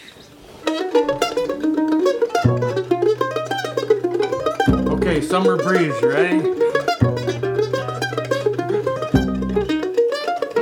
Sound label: Vocal